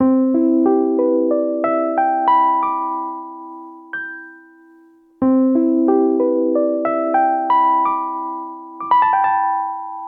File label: Ostinato Two Hand Arp C Major7 92 bpm, Electric Keyboards; MIDI Clips; Ableton; Factory Packs